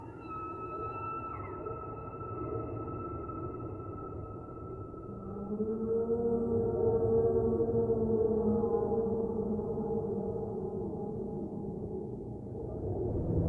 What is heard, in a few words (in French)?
Chants de baleines